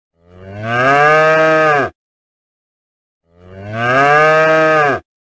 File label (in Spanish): sonido vaca